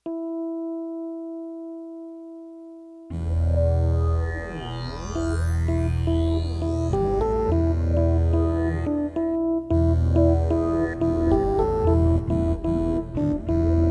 176 BPM

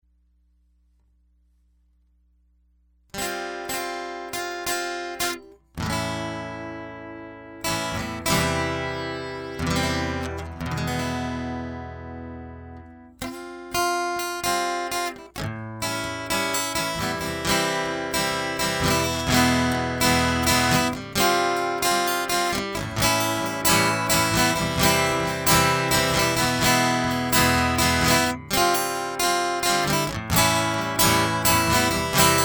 Guitar Loop Prelude

